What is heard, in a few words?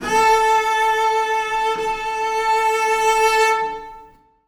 cello a4; Sonatina Symphonic Orchestra